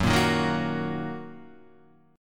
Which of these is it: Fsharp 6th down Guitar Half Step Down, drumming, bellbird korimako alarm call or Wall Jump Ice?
Fsharp 6th down Guitar Half Step Down